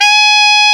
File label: SAX XALTOS, Sax Sect.; Saxophones